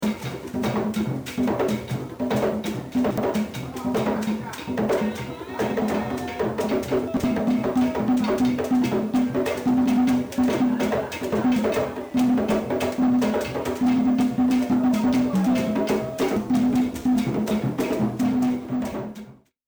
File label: ghanaian